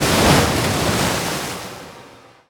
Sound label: riptide; weapons; dota; naga siren